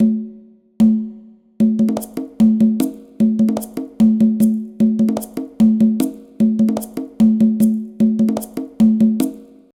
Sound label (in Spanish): Bullerengue o Bullarengue (100 bpm)